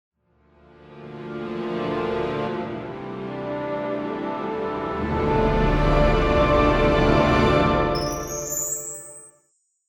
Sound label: Logo Music